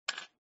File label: doubling cube, backgammon